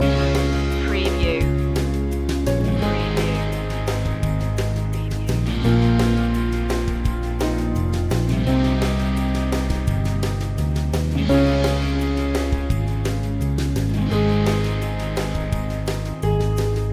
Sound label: lovely landscapes loop